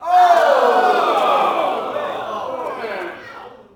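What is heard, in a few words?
Boxing Crowd Small Reaction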